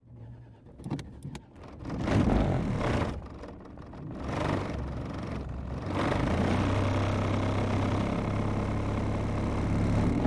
Car; Start, Idle With Very Heavy Rattles, Off, Interior Perspective